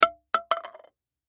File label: Cups Plastic Drop, Cups Plastic Drop Childrens; Household; Blastwave